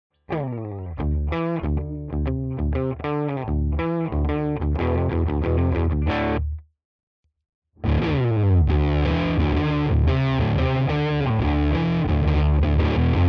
FUZZ Filter Flat 12 Gain min 9 12 15 max

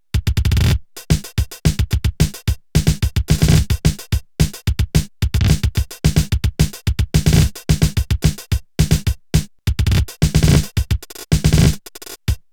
Loop

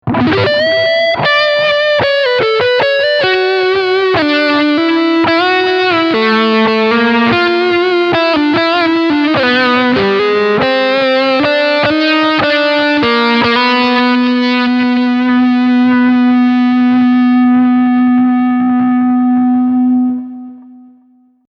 Front HB Leadline

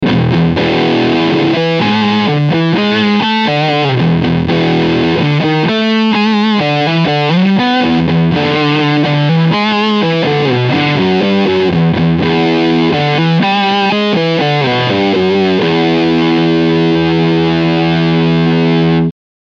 Kingmaker Heavy

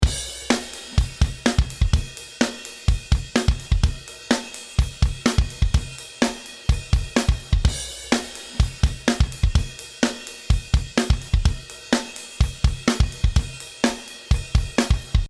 Drums LA2A

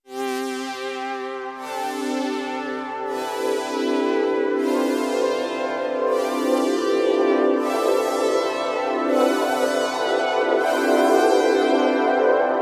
Korg minilogue xd

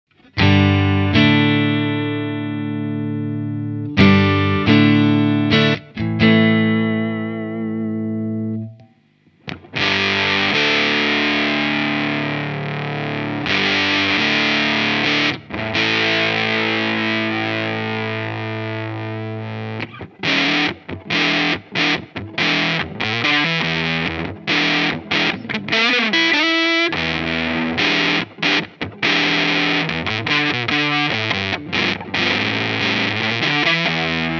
Pepbox Amp clean; British Pedal Company

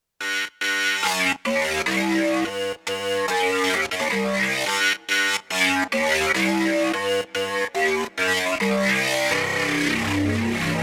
Sh-4d Model Wavetable Industrial

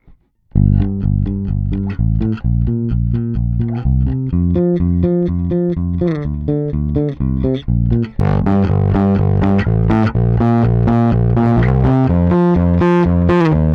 Scrambler

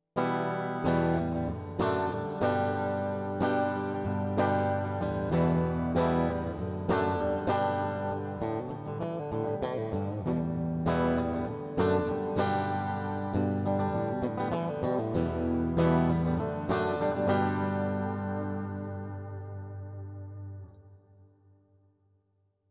Zoom G2X FOUR Acoustic Simulation

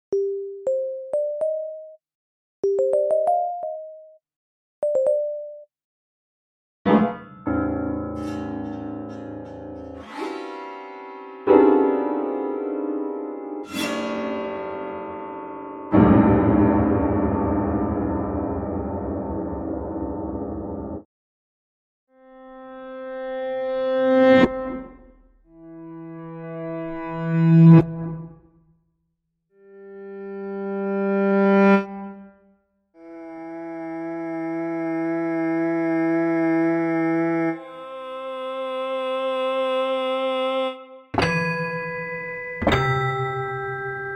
Toys Character

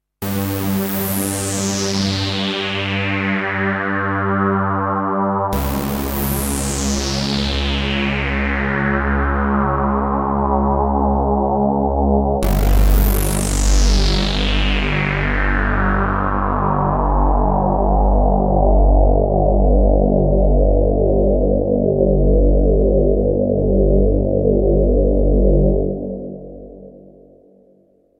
LP Filter Decay mit FX

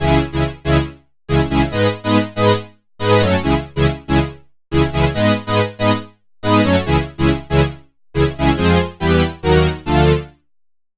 Techno Strings Chord

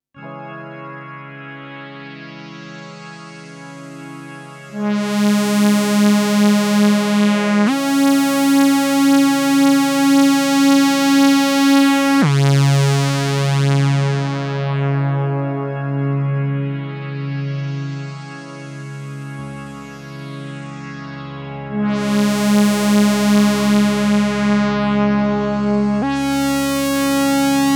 Space Pad

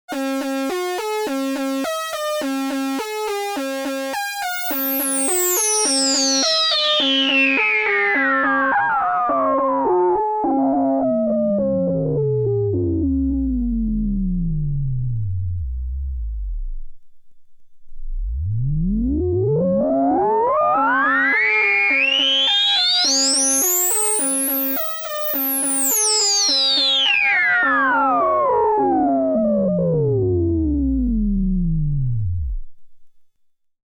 Filltersweep

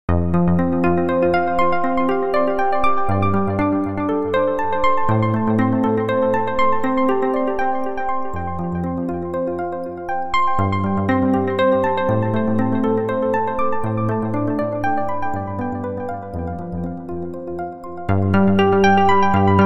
VA-1 Arp Epiano

